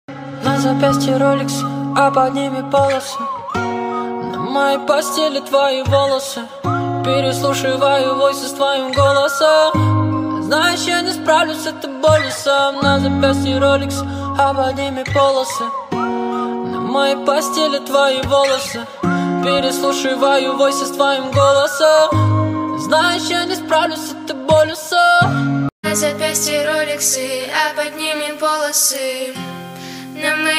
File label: golos cover